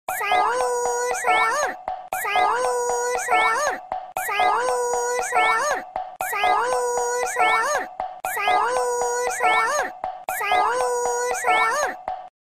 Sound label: Sahur Sahur Ringtone